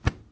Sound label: Light Body Thud On Clothing